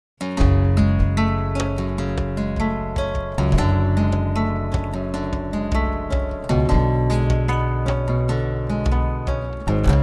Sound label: Gitarrenduo, Gipsy Rumba